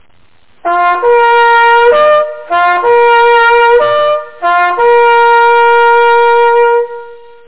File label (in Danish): Forstaaet, jagthorn; Askov Malt Jagtforening